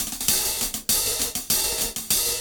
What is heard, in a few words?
KL Beat 98 BPM; AMG - Keith LeBlanc Phat Butt Jams